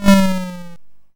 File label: YM Fire By; SFX; ChipShop Deluxe; ChipShop Hits